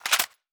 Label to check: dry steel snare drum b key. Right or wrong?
Wrong